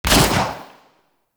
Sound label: Fire Shot; Warframe; Lato Vandal Redux